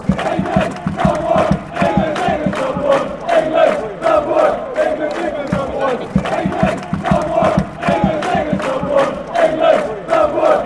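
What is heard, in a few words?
fanchants, football chants; englands number 1; stevenage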